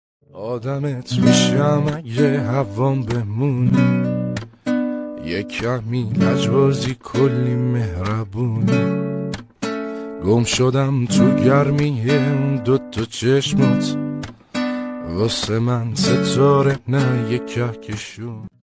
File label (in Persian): Ba Gitar